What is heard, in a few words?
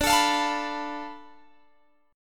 D Minor 6th Guitar Open G